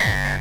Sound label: BD Distortion, Bassdrums; Vengeance Samples